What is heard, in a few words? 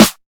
short snare sound A key, snare drum tuned to the A note, Loudest frequency: 2415Hz; snare drum samples